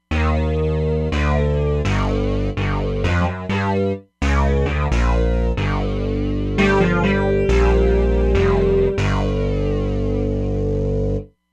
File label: Matrix Bass, Roland Fantom XR